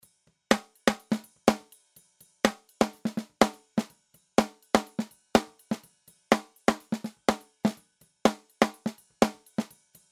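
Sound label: snare dry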